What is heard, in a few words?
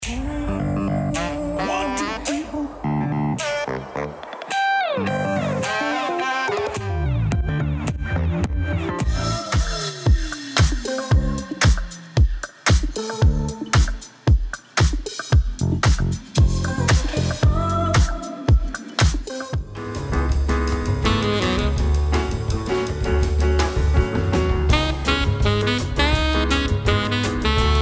Music for Rooftop and Beach